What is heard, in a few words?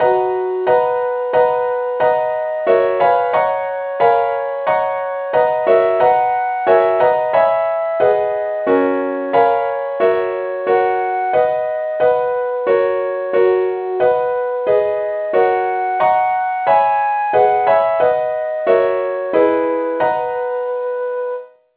advanced lead key b; saintthomas